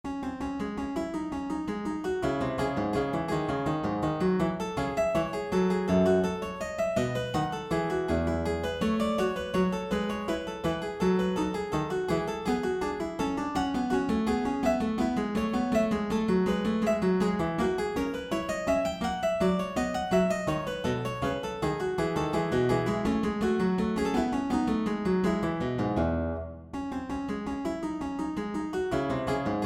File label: little prelude clavichord Neupert; BWV 935